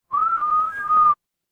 instrument whistle

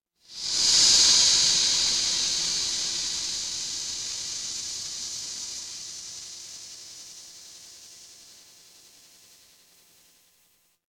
ambience room tone

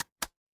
ui click

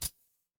feedback checkpoint

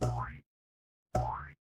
cartoon boing

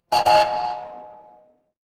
door doorbell

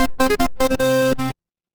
retro game game over

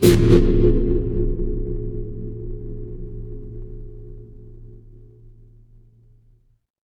fire electric arc

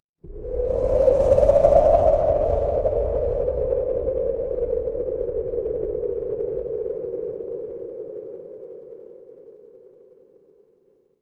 ambience wind

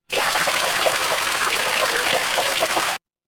water stream

